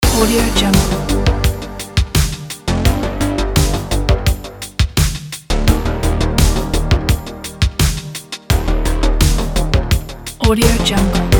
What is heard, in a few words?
sad night retrowave; boxbeats